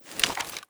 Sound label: aug firemode, weapons; STALKER; IWP